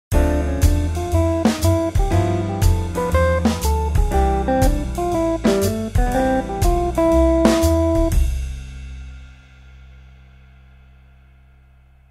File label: Scale; Tomosax